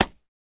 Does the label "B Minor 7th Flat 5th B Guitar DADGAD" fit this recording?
No